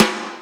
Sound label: tallywhack, snares knocks